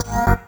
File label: Bb STAB; funky; Loopmasters; Funky Underground Vol 2